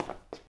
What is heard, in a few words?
footstep